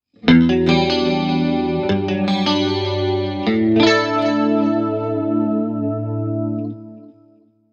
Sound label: guitar sound